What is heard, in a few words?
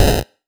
Snare, 8-Bit; 8-Bit Kit Volume1